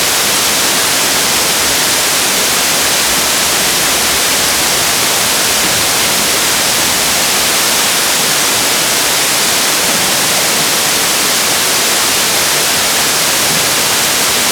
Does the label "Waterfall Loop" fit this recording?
Yes